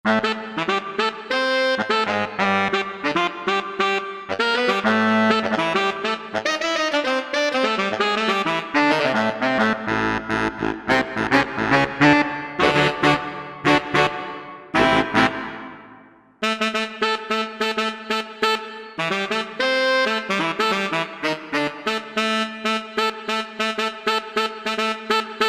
Velo SW sax; Korg MSC-1S Variety

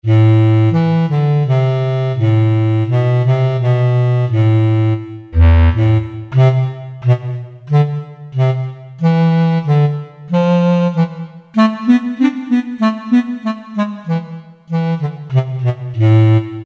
Bs Clarinet, Roland SR-JV80-02 Orchestral

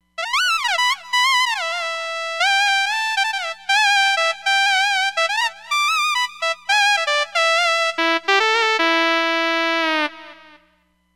Waspy Lead

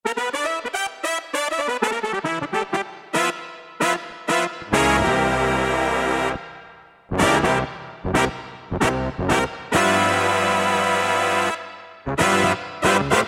BR BigBand, Yamaha TG77; Factory Presets

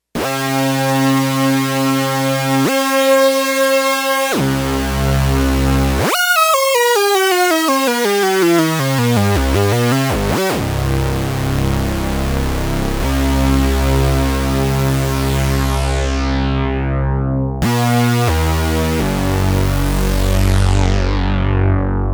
Unison Glide With Resonance

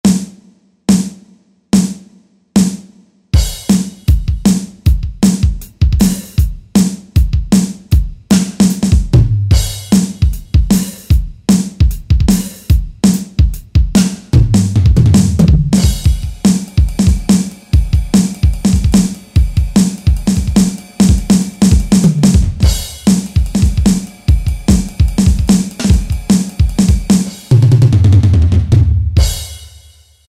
Rock Kit, Yamaha DJX; Voices

